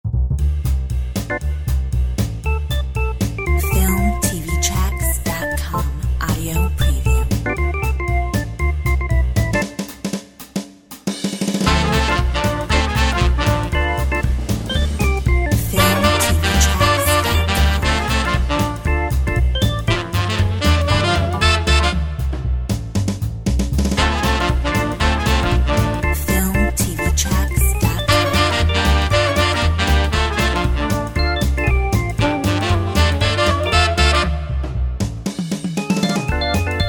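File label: Shopping ballad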